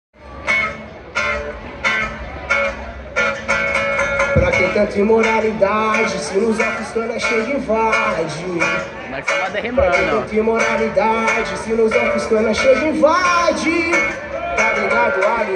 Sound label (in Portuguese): acustico ao vivo